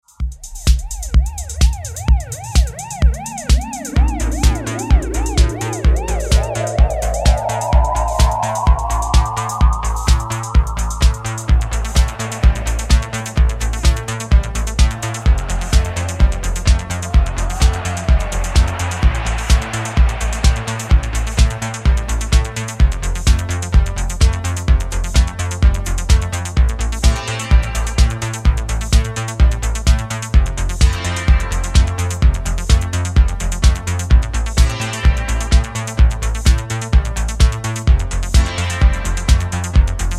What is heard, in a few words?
Instrumental